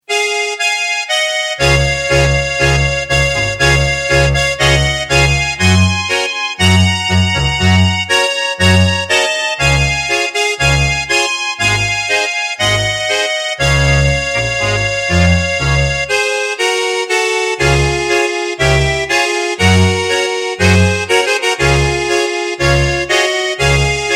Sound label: Tango